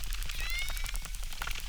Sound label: Chirp, acquarium